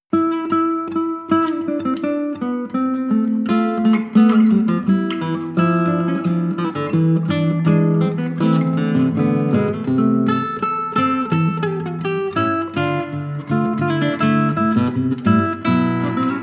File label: Fuga BWV 1000, chitarrista